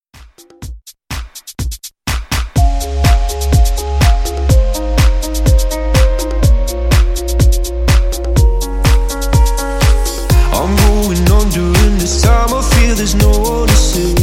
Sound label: Pop Top40 Redrums; Remixfreakz